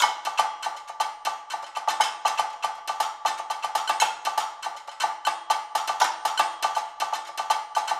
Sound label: perc loop 120 bpm